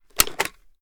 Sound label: reload mag out, ar; weapons; gmod; viper